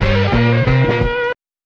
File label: guitar rock; Loops